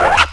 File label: SCRATCH